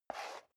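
step leather shoes concrete slide short, footsteps; schritte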